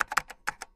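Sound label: computer keystrokes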